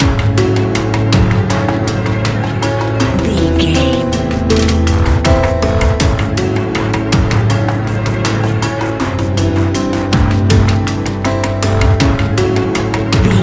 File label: modern horror